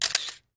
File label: Camera; Puyo Puyo Puzzle Pop; iOS, macOS, mobile